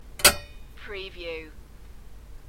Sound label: Lid Clank; beanstalk audio